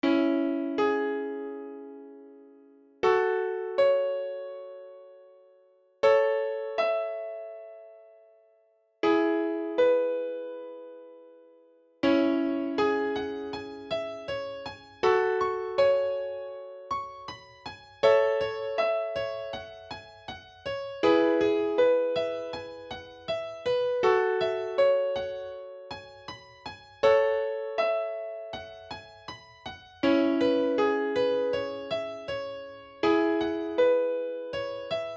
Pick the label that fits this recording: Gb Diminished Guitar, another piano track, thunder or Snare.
another piano track